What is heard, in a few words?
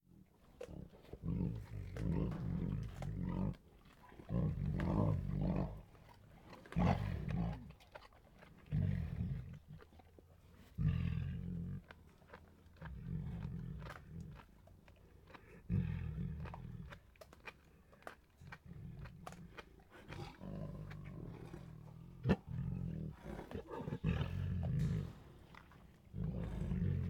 Sheeps; KMP Film Video Sound Effects